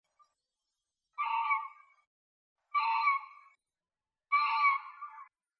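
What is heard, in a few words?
kiji call, green pheasant; nakigoe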